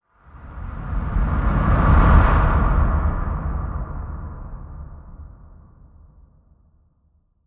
Expanse Winds Whoosh

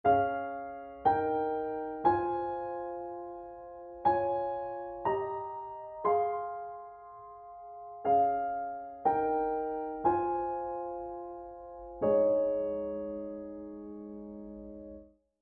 Piano

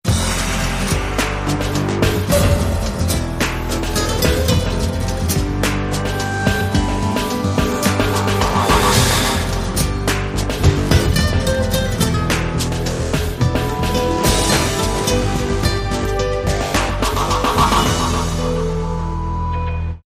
Spanish Fire

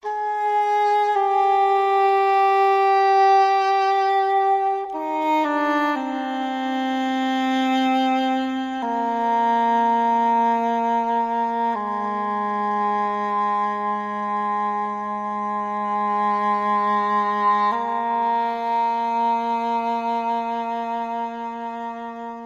Woodwinds Only

